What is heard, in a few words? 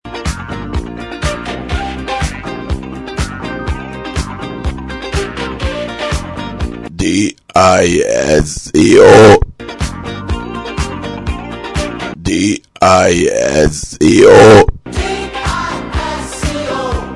disco